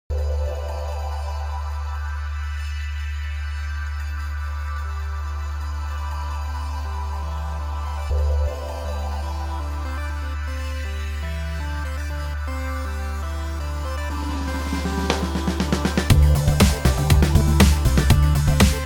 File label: Synthesizer Cowboy